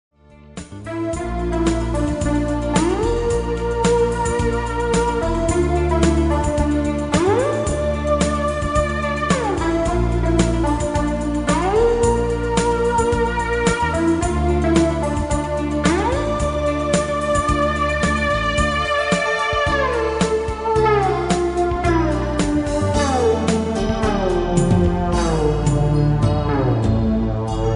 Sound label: Portamento